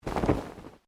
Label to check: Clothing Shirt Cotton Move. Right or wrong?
Right